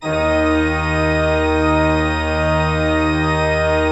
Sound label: D2, Cathedral Organ; ILIO; Propeller Islands Cathedral Organ